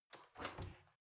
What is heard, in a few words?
oven open soft